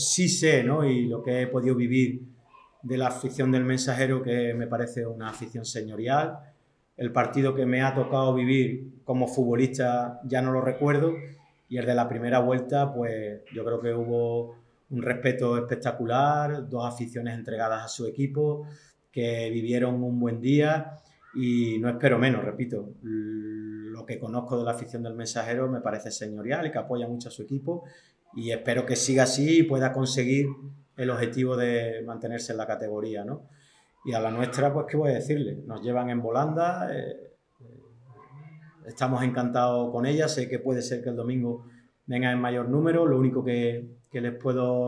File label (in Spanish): ambiente q espera